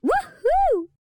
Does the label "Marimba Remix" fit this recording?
No